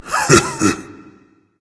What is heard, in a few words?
heh heh berserker; cuchulainn